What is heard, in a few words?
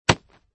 collision Rock